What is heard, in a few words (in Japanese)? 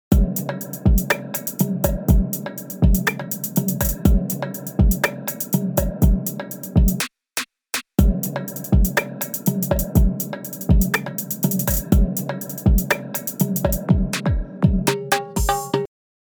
Drum All, リズムトラック全体; Subトラック; Logic Pro 11; パーカッション